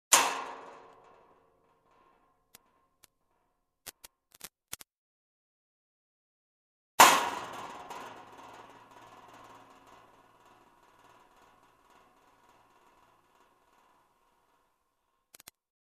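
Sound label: stone thrown at a metal fence, hit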